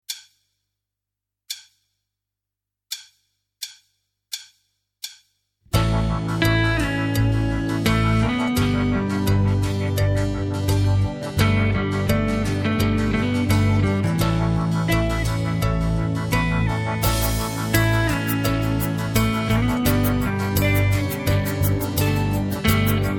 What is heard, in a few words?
D NO DRUMS